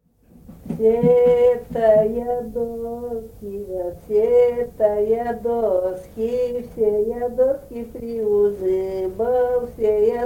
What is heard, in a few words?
solo; Все-то я доски приузыбал; Белое